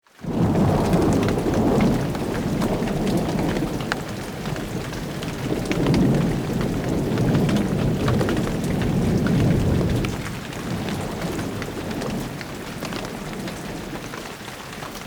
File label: rolling thunder with rain; Rocky